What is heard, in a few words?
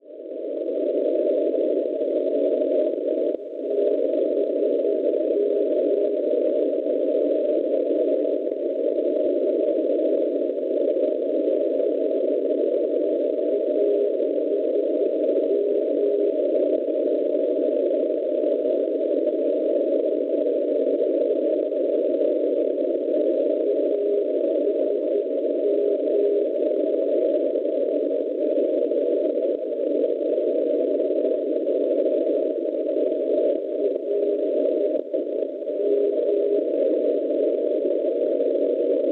NDB 367 kHz; ASM; Clermont Ferrand; Francia; Schwarzsee